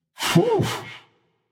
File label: sigh; smite; osiris